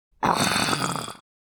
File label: Alien creature pain death